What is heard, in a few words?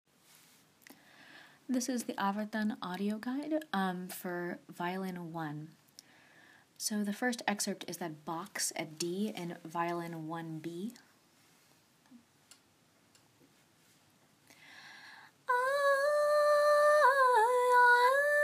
Violin I part